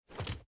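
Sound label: footstep armor; smite; kuzenbo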